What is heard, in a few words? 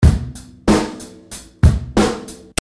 beat